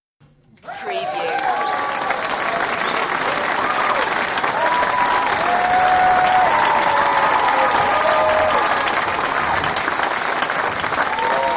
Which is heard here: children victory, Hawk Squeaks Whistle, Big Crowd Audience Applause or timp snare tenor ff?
Big Crowd Audience Applause